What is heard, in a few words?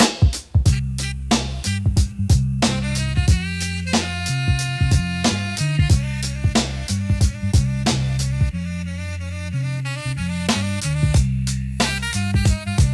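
Brooklyn beat, barbershop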